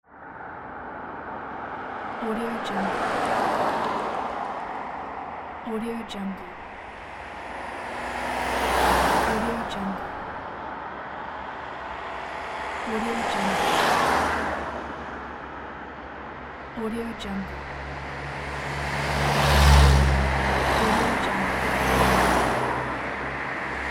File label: cars drive